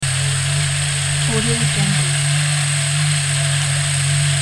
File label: little drone idle